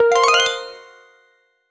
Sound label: a rising 23 note harp